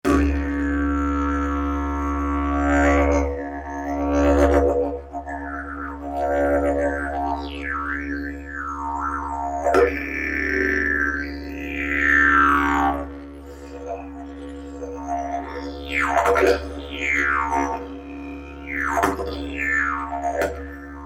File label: didge